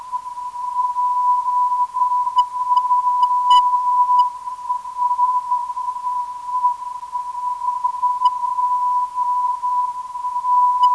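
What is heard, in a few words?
ST 527.07kHz, non-directional beacon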